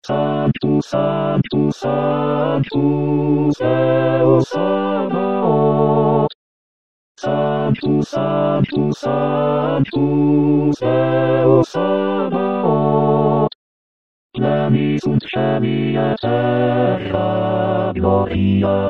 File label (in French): Sanctus, Chorale